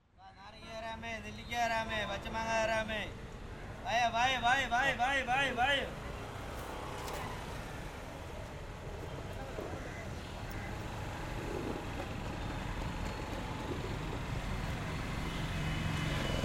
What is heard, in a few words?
MARKET AMBIENCE; HENGANOOR